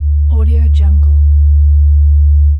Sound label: hum